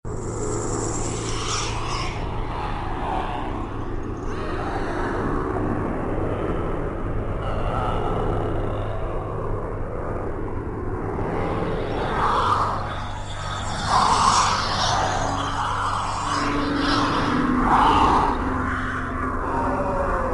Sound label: Atmo